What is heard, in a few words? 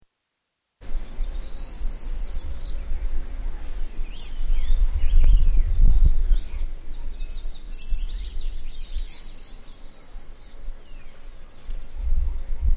jungle colombia, environmental